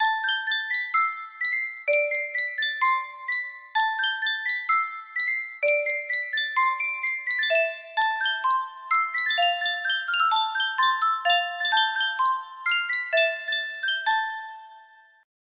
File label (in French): Yunsheng boite a musique